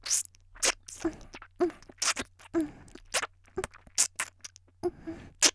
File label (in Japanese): 「フェラ」ノーマル; 「フェラ」ノーマル5秒; 綾波系少女